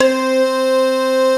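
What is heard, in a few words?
DIGITAL CHASE; Greytsounds; Scott Peers Sound Engineering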